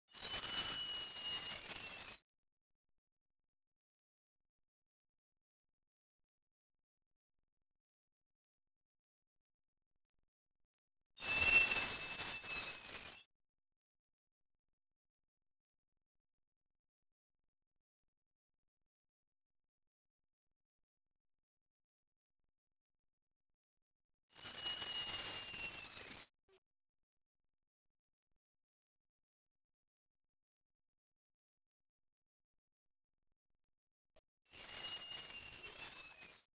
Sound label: Typical whistles, loris